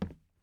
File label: plastic step